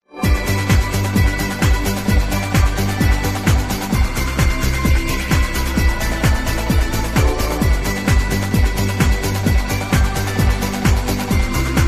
connect music; jbm addon

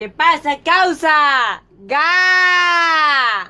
gaaa

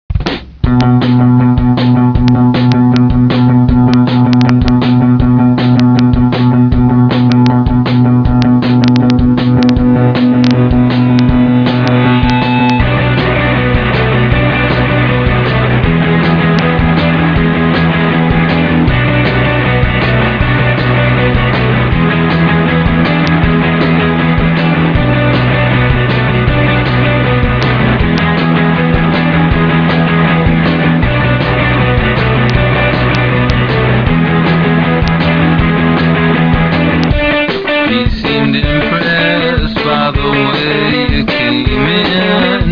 music; jb nuke csbr

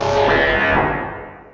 mz sirens; cstrike

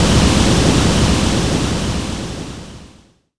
flood

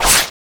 strider hit; cstrike; knifes

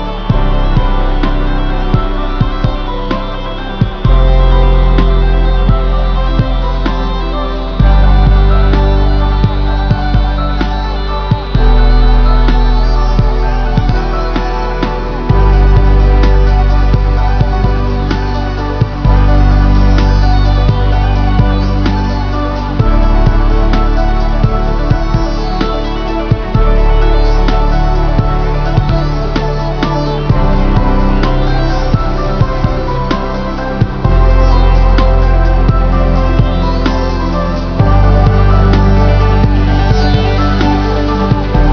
village music; jail village prison

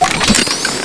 jammer

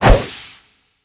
shoot; transformgunadv

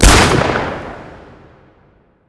jump; samurai; ZP CRISTIAN; cstrike

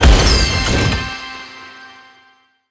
drop, item

